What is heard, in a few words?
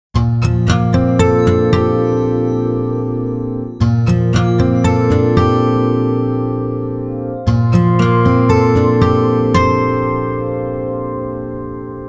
Harmonic, Nokia Tones; Windows Phone apps